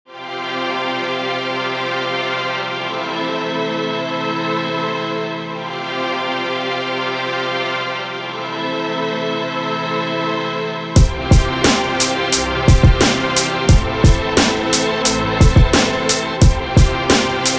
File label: Beat